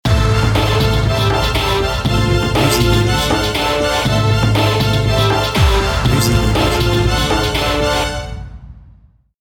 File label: suspense jingle